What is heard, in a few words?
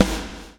BLOW; Spectrasonics - Backbeat